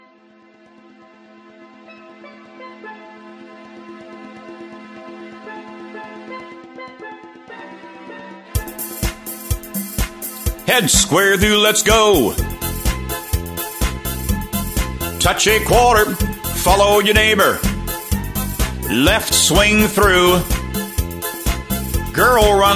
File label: Patter